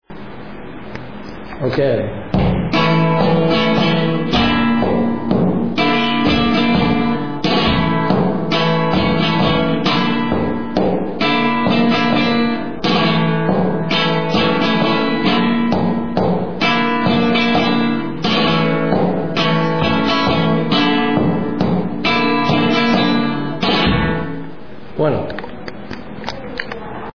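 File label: Bulerias, flamenco guitar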